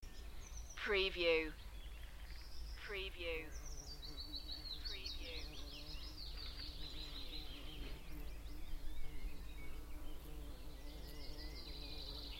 Spring birds song Robins and bumble bees Beacon Fell Preston; beanstalk audio